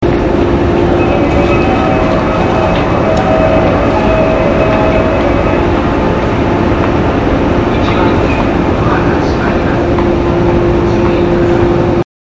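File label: ushiku, departure bell; zyoban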